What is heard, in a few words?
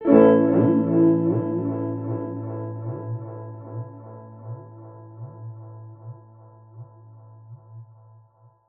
Guitar Chords Amaj; Zero-G - Future Wave